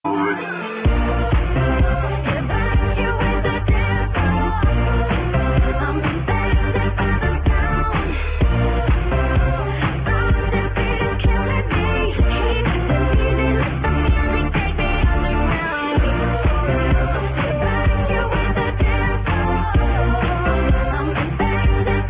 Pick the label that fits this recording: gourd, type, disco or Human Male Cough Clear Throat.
disco